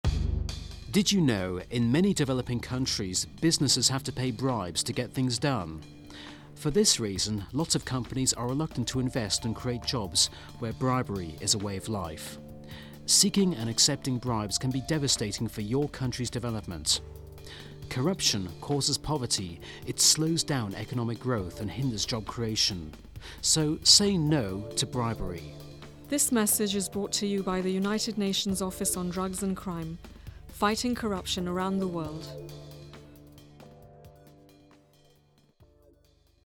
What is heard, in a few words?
Music